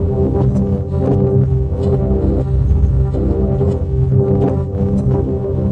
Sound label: crunchy big thick pad; LOOPING AMBIENCE